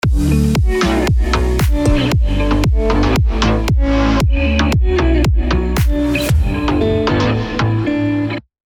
דרופ אמצע

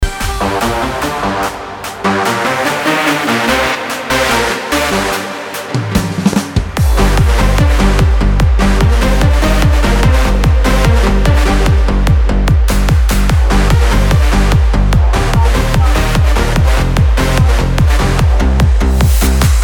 רינגטון

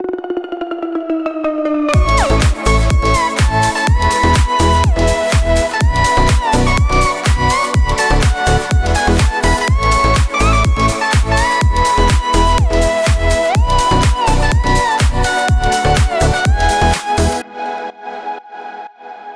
דיפ האוס קייצי

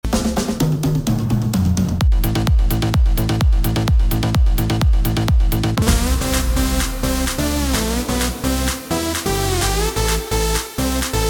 מקצב וטראק באמצה בניה

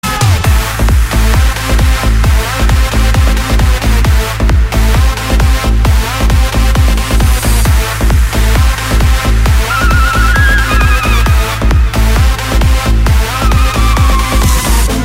רינגטון

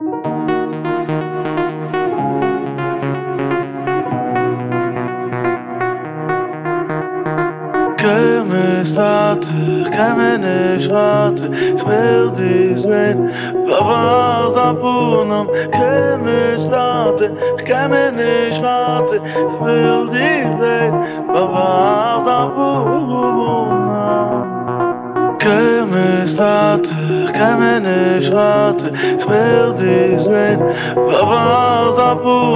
דיפ האוס

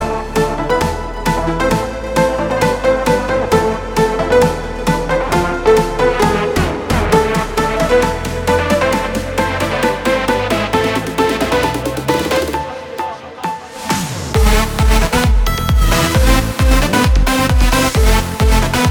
רינגטון

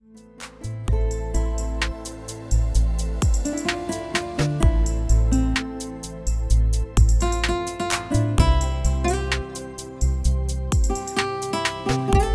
שקט אלקטרוני

